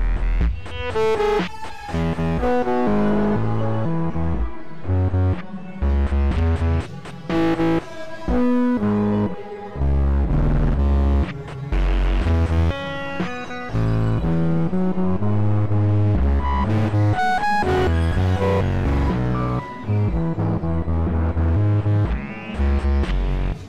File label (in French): fanfaronnade generative, vcvrack